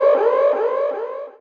shield; Alawar; StarDefender4

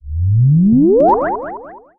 battery; AssaultCube; Alien Stars

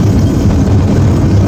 coaster loop; Left 4 Dead 2

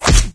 hit punch; npc

